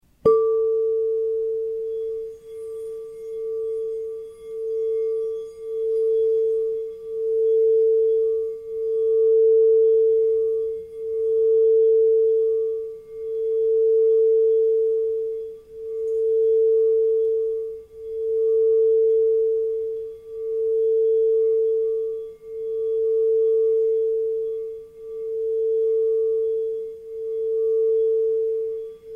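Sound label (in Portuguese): FOSCA A4, instrumentos de quartzo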